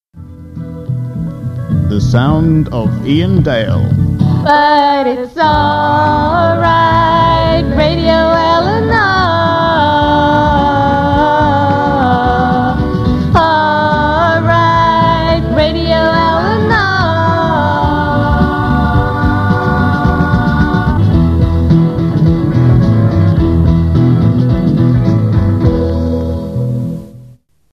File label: Jingles